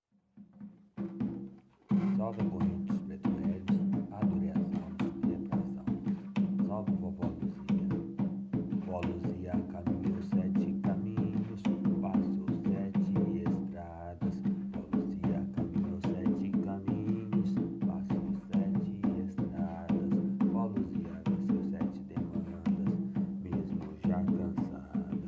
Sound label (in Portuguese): ponto cantado de preta velha; vovo luzia